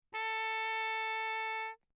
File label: TRP MUTE Bach; Perinétventiltrompete